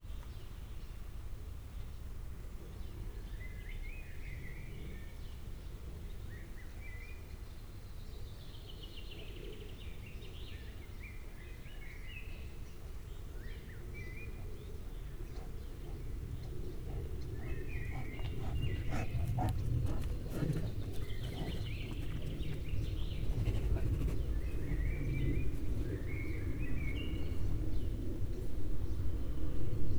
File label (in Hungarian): kifutoban repulo, bengali tigris, allathangok, zoo; miskolci zoo 2009